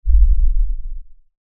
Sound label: LFE Ultra LFE Hit, Lfe Ultra Low Bass Hit Impact; Deep Tones; Sound Design; Blastwave